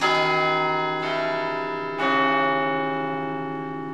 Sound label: bell loop; ambient; AssaultCube; Alien Stars; American Muscle Series